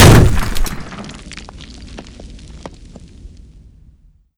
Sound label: brick hit; tf2classic